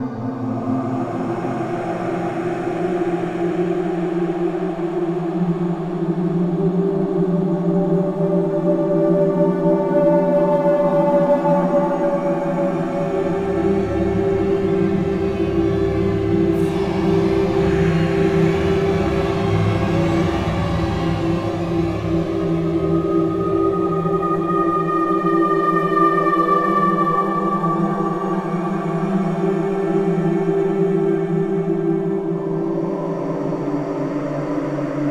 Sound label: Level Windmill; Dungeon Hunter; android game music